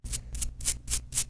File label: wire brushing; tbd-station-14; Items